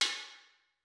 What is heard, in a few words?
clank